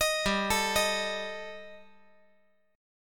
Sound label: G Suspended 2nd Sharp 5th G